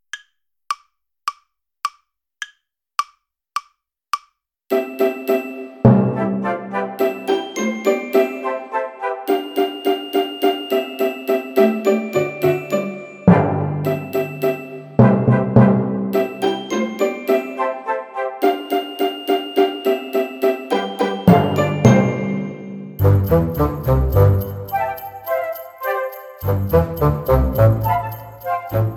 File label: playalong fast; jingle bells